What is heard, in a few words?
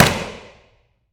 Boxing Slap Stick